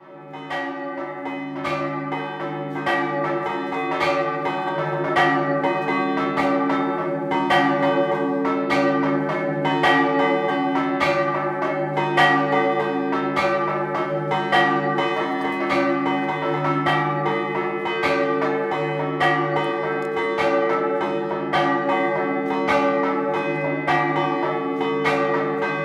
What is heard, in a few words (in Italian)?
Prossenicco Scampanottata, campane